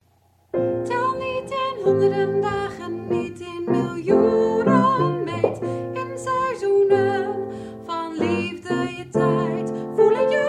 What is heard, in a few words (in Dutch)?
MEZZO